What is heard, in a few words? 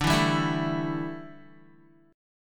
D Suspended 2nd Suspended 4th D down Guitar